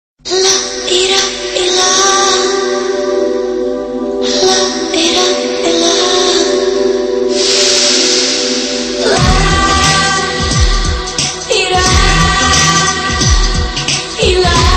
Ad Tone; Liril

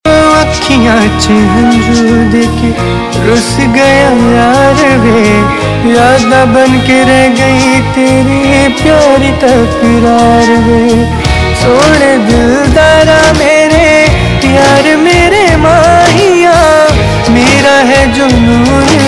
Ringtone, Bollywood Punjabi Pop

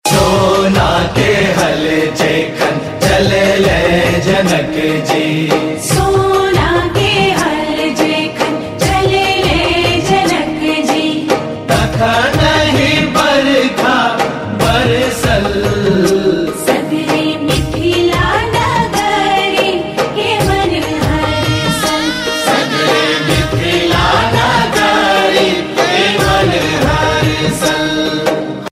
Sita Janam Tune; Siya Ke Ram

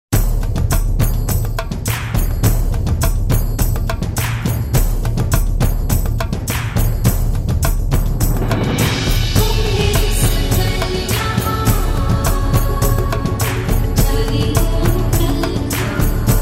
Theme - Chorus; Subhash Ghai; Kisna; Kishna

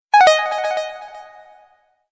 Universal Sms

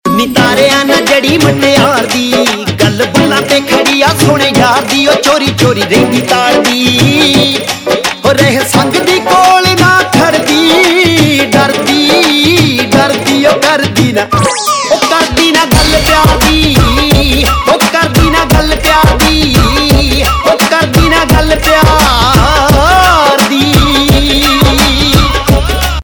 Dhol Mix; Punjabi Pop Ringtone

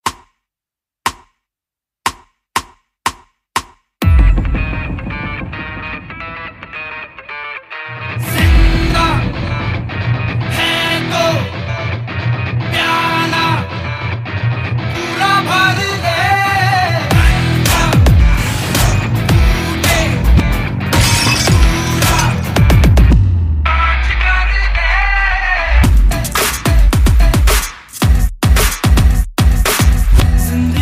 Hip Hop-Break Beat

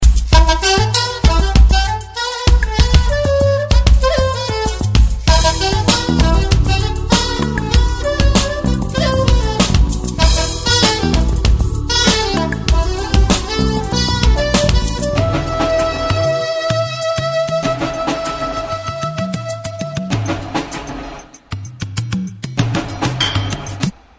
New Jazz; 3D Tones

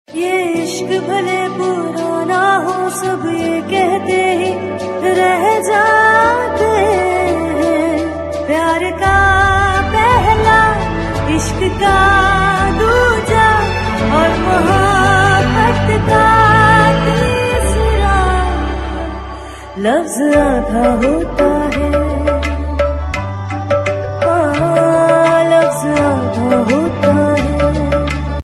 Female; Zee TV; Ek Tha Raja Ek Thi Rani; Saawariya

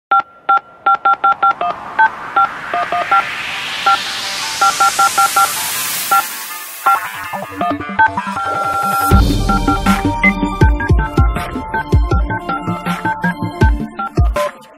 theme music on dial tone beep; Airtel new

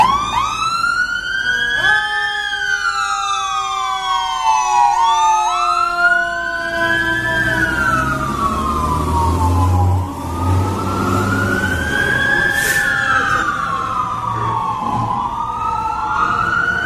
Fire Truck Sirens

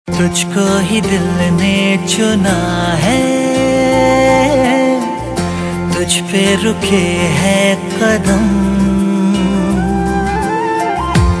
Title Tune; TV Serial Tone; Sony

